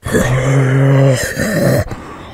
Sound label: Zombie; Blind Survival